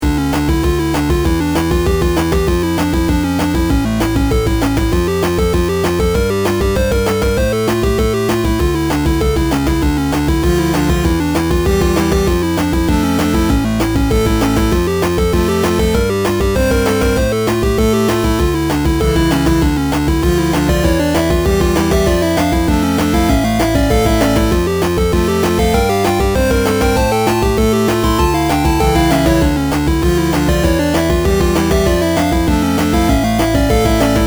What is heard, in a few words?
8-bit